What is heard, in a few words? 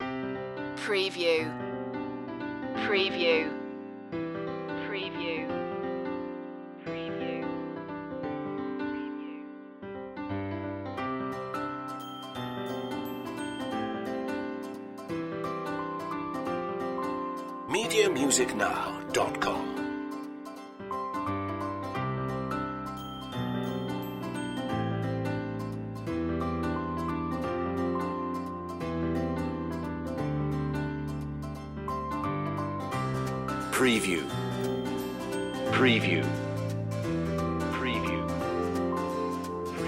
strolling no drums